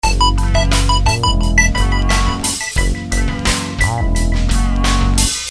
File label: break